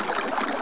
WATER LOW

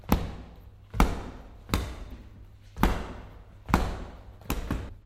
punching bag

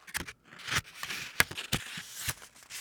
book page

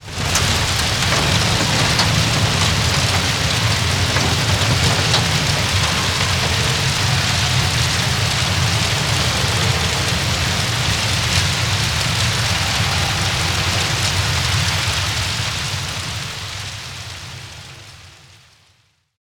debris fire accident

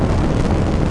flame thrower

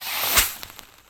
rocket firework

